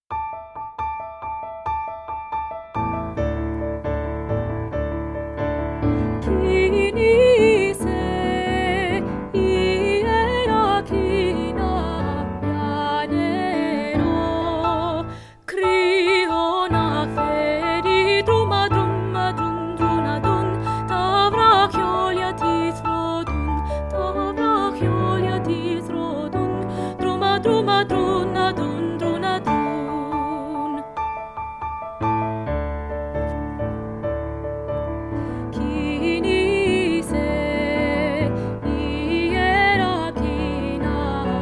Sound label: alto